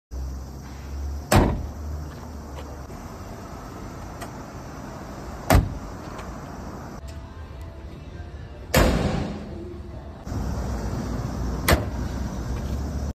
capot; کاپوت ماشین

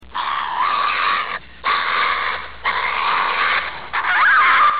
Mustelidae

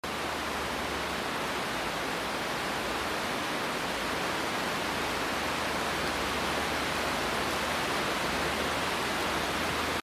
greenhouse

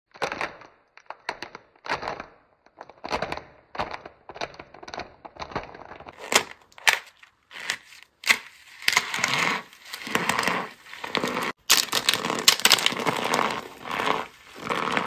chalk crunch; اسمر

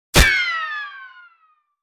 bullet ricochet; کمانه گلوله

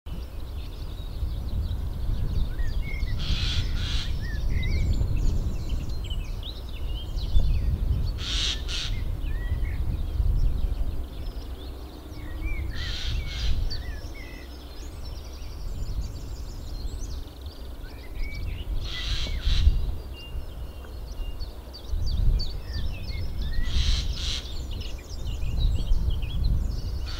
Eurasian jay; جیجاق

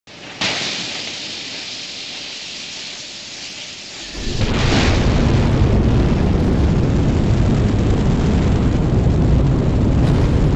space shuttle; پرتاب شاتل فضایی

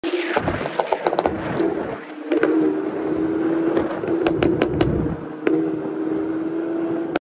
Golf Exhaust

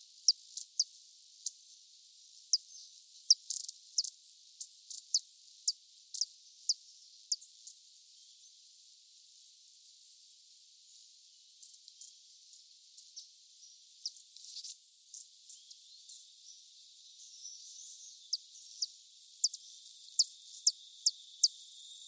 Spangled coquette

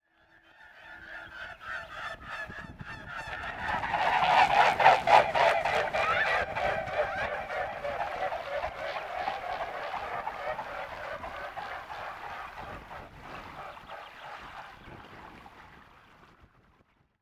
Mute swan; قوی گنگ

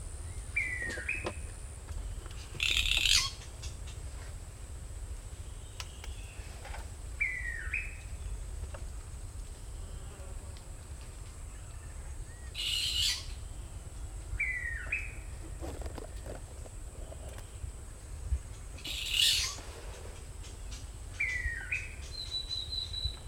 blue pitta, پرنده پیتای کبود

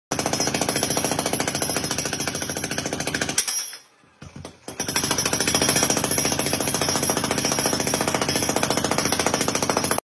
Jack Hammer; پیکور برقی

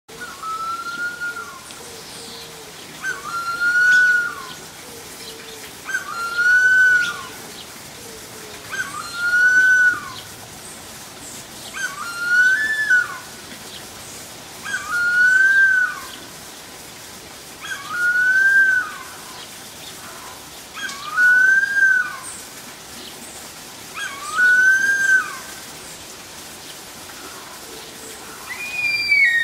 Harpy eagle; عقاب هارپی

